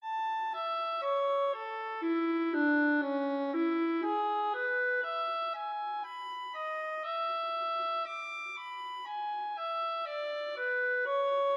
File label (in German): Entwurf eines Presto; Beethoven Ludwig van; Skizzenbuch pag. 64